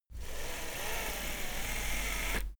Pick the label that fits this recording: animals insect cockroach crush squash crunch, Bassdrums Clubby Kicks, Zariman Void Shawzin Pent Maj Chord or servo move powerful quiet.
servo move powerful quiet